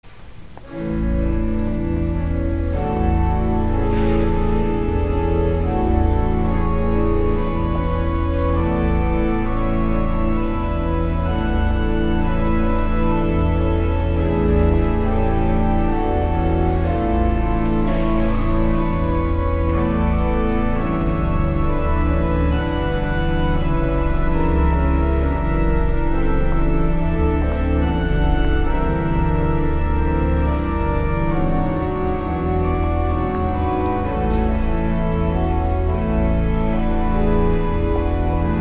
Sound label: Organ, Chorale Prelude; St. John's Cathedral, Jacksonville Florida; Marcel Dupre; 'In Dulci Jubilo'; Christmas Day; Holy Eucharist